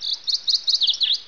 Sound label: tweet